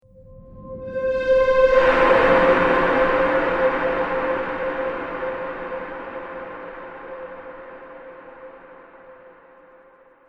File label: Trailer Hits Twin Pack; Miscellaneous Impact Sound Effects